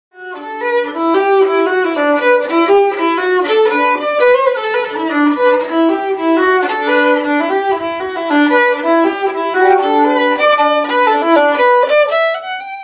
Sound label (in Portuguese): Vinheta cantada Violino